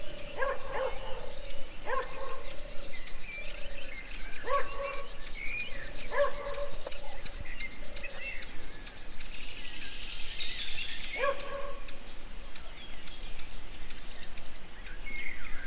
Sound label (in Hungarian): rét patak, mezei pacsirta; allathangok; termeszetben; egerszog